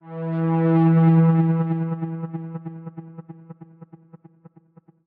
Special-Photo Impossible-Triangle Synth

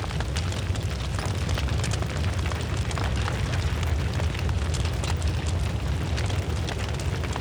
Props Object On Fire Loop

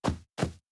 Birds Footsteps Loop; Josh Journey Darkness Totems; PS4, Switch, Windows, Xbox One gamerip

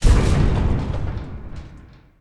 Big Lift Arrive; Ruins

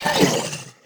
Monster Effort Big; Monster Lizzie

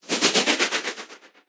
Appearing; Flycaller

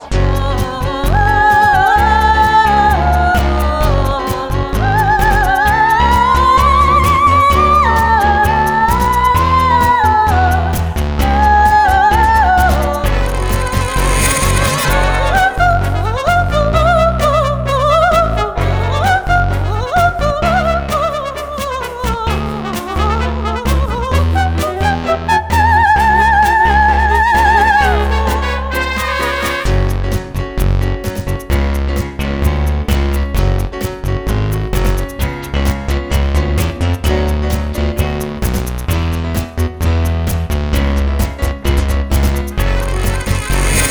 chrous 128 beats; Beatbuddy; Wii U

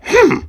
Attack; Sly Attack

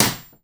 impact hammer solid

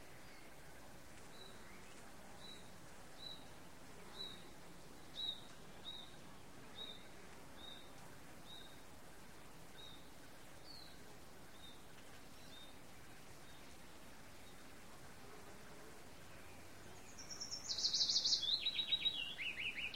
Battle Ambient; Mount & Blade Viking Conquest Reforged Edition

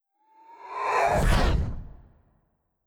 Skel Drake Spectral Prep; Legend of Keepers

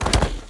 Enemy Strigger Run

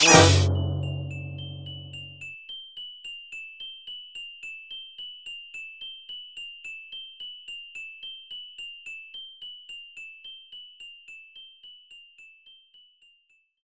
Death BGM; Yoshi's Story; Nintendo 64

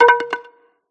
Sfx Baseball

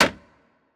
Boat Man Wood

